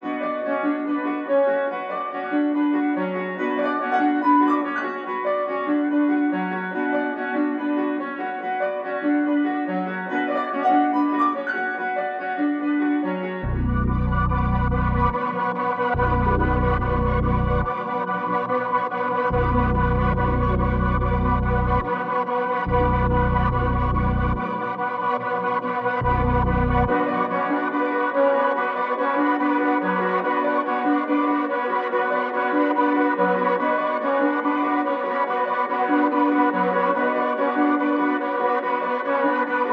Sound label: 143 BPM F# Min, Melody; Haunted; Cymatics; 2022 Melody USB Expansion